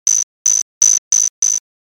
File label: insects